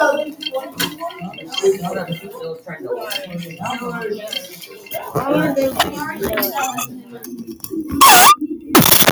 falls down the stairs and explodes; lego man

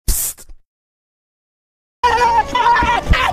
screech

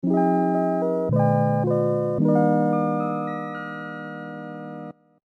game over; smw

